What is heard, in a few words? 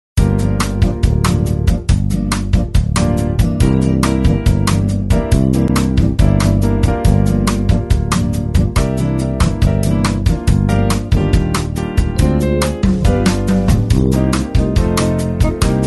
Samba